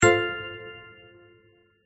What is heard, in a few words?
Combo; gardenbloom